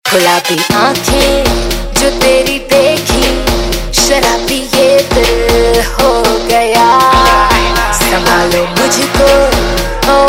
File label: Female Bollywood Ringtone